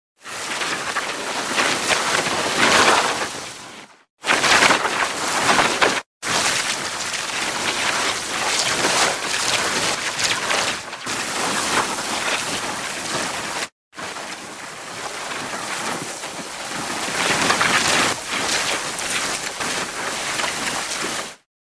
otdelnyj shum proeda cherez luzhu

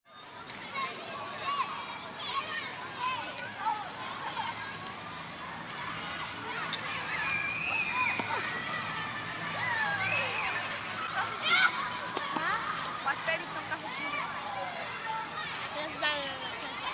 deti devjati let igrajut na ploshhadke s mjachom

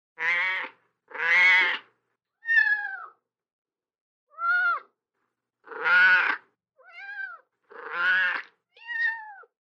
hriplaja koshka zovet kotenka on ej otvechaet

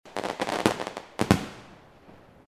tresk ognja; Звук петард

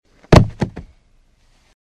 na pol

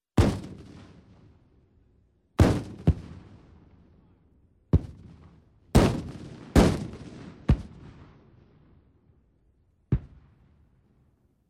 Protivotankovoe reaktivnoe ruzhe

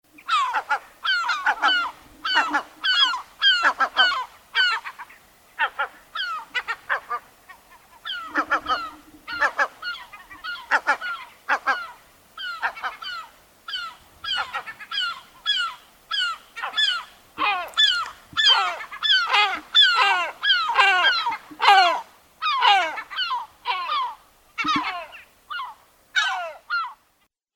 morskaja chajka

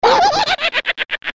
smeh zlobnogo karlika

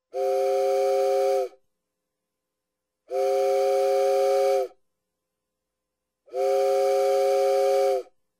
gudok parohoda

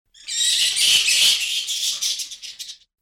pisk i strekotane

